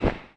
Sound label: WING; ageofwonder; Moves